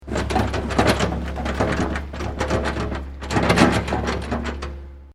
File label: Heavy Metal Shaking Rattling, Shaking a large metal object; heavy metallic rattling and clanking